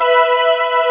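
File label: ambient pad, synthesizer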